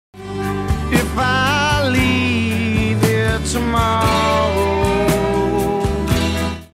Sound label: Vocal